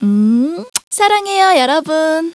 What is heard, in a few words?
kiss; CSO